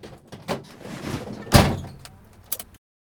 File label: enter; CosmicRage; farming